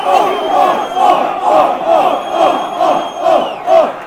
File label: off, fan chants; wimbledon